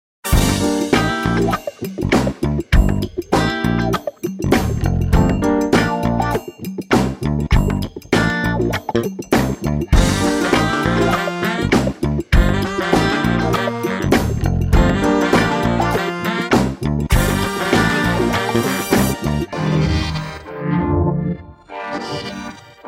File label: mayhem chase no vox, Music Cues; ScoreCue